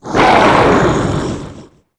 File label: Unbound Elemental, Enter Play